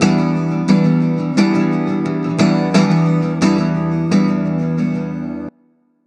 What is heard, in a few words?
Acoustic Guitar; neuro; Stanza